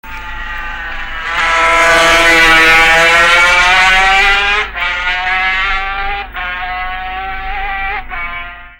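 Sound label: Kawasaki 125, motorcycle; 1967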